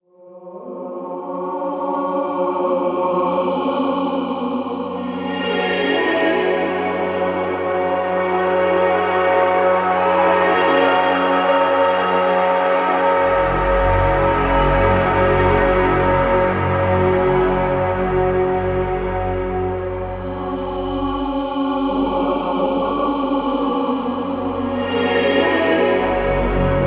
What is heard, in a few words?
Underwater Swimming; assassins creed origins unofficial soundtrack ps4 windows xbox one gamerip 2017